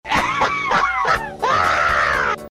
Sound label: creepy laugh